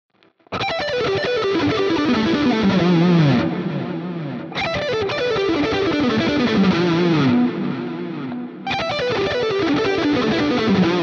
JACKSON AS Virtuoso SHP HighGain PentaRun all positions